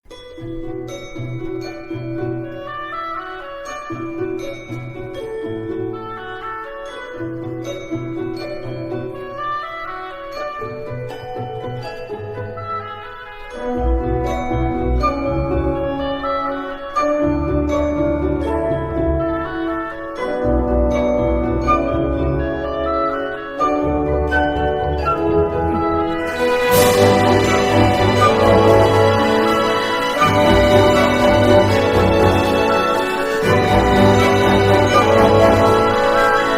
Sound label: questioning